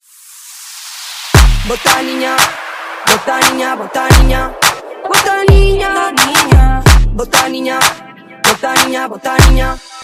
Funk Dancehall

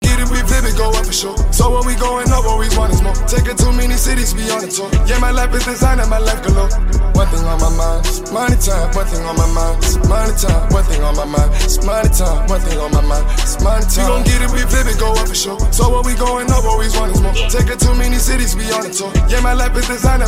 rap hiphop

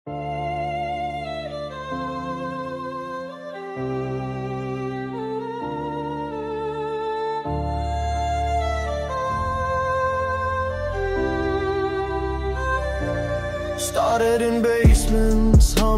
Epic Dark-Fantasy Trap Orchestral Anthem

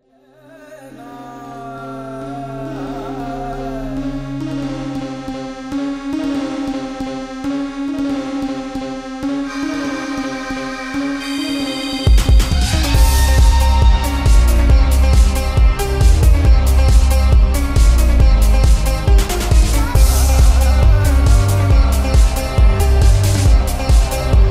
Indian Phonk

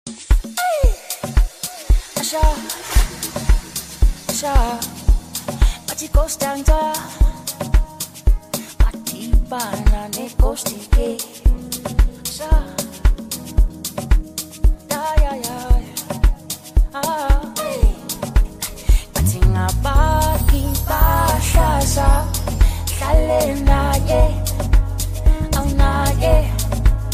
AMAPIANO

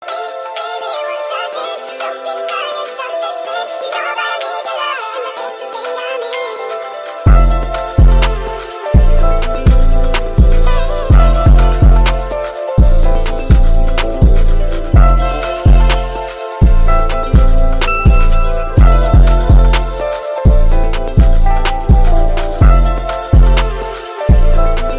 Instrumental

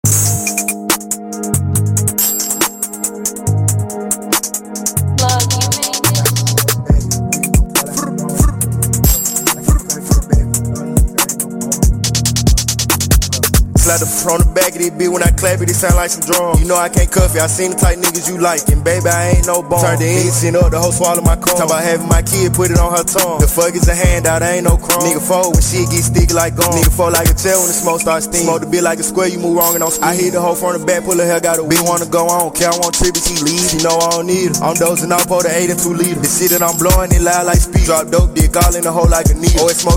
Drums

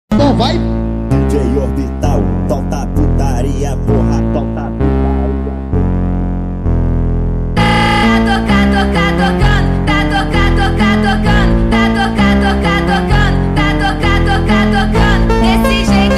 phonk; avee player

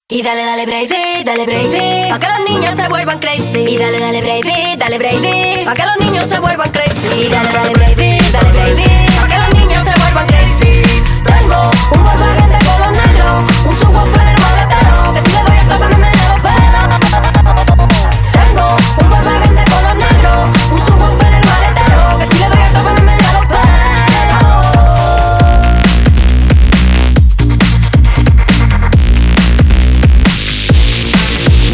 BREAKBEAT

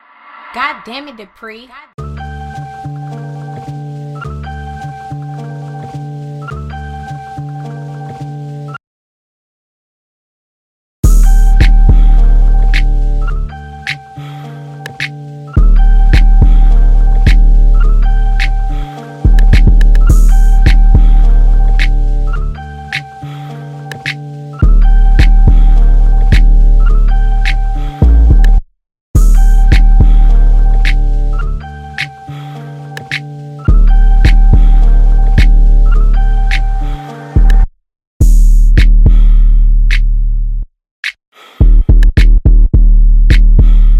Instrumental

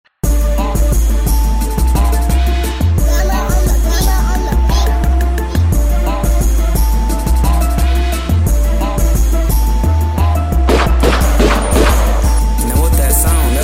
rap; louisianarap louisiana rapper

